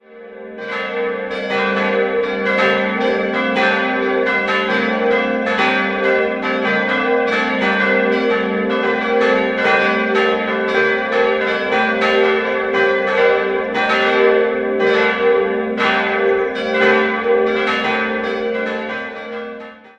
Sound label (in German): Glocken; Poikam